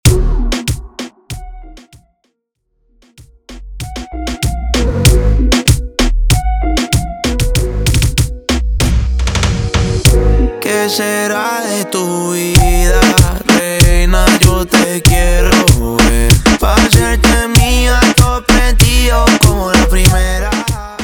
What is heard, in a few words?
Intro Chorus 96 Bpm; Latin music remixer from Ecuador